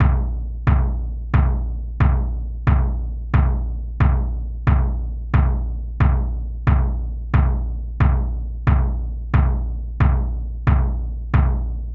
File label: ISOCHRONOUS 90 bpm